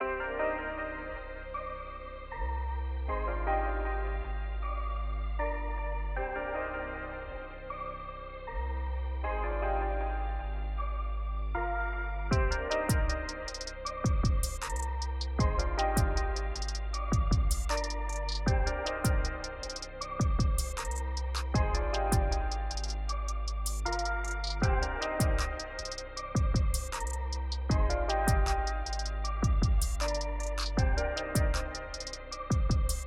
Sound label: bpm156 key Cm